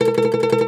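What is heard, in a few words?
OUD tremolo, Plucked Strings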